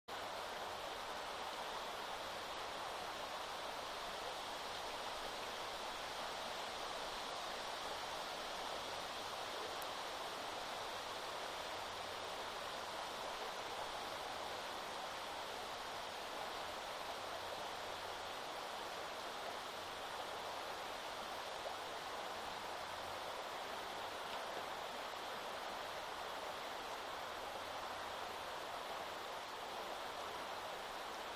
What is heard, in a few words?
riverside; Kyoto U Ashiu riverside